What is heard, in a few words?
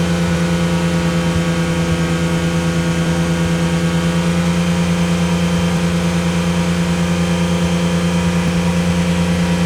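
Ferrari Testarossa Loop, Automobiles; SFX Kit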